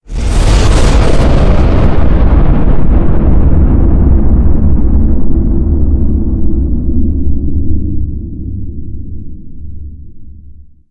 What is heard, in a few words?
standup groundshake